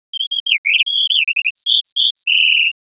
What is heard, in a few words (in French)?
merle